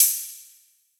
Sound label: cymbal fingers; Hip-Hop; Drum One Shots; SD CAICOS Hip-Hop Sample Pack; Slate